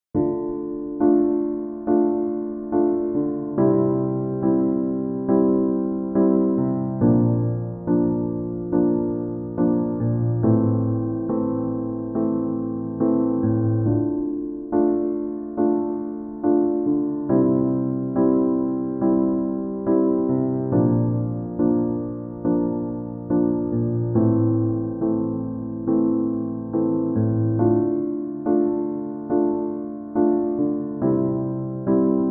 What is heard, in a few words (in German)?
Impro along Lamentobass Leitereigen Dm